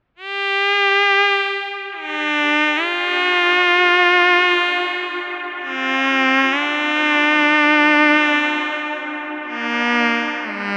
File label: MOOG MAVIS Delayed FM Lead w RE 301 Space Echo WET Reverb